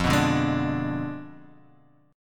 F Diminished 7th F down; Fdim7 strummed; JGuitar

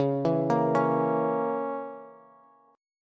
Db Minor 6th Db Bass; Dbm6 arpeggiated; 21,19,20,18; JGuitar

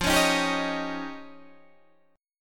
F 7th Suspended 2nd Sharp 5th F Guitar Open D; 15,16,13,13,16,13

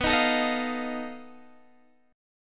B 7th Flat 5th B Guitar Standard; B7b5; 19,18,19,20,18,x; strummed; capo=2

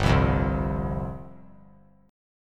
G 7th Suspended 4th G down; G7sus4 strummed

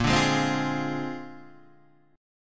A sharp 9th A sharp, Guitar Open D

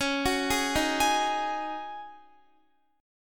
Db Suspended 2nd Suspended 4th Db Guitar; arpeggiated

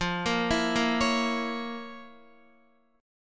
F Major 7th Suspended 4th Sharp 5th F, Guitar; FM7sus4#5 arpeggiated; JGuitar

